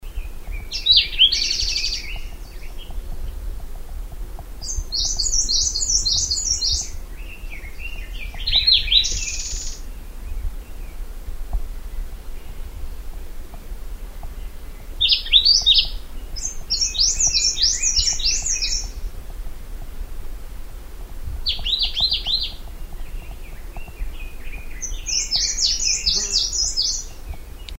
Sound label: Martens Warbler, China Hunan Hu Ping Shan; pouillot de marten seicercus omeiensis